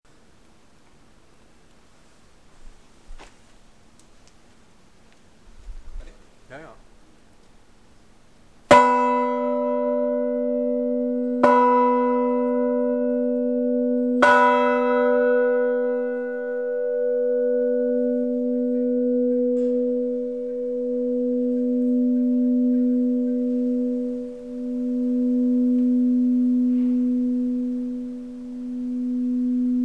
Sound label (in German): glocke, kirche